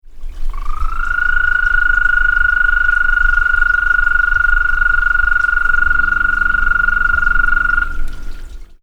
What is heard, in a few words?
bufo americanus